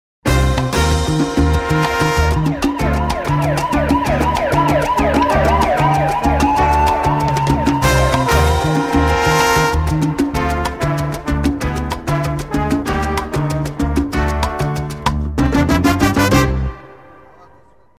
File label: dissonant